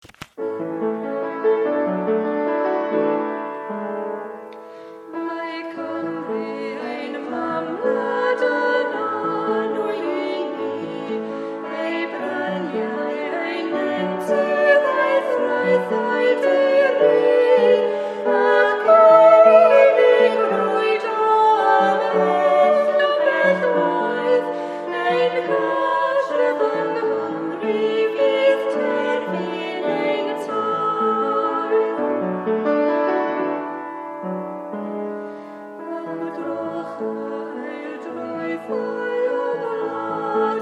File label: duet all 3 verses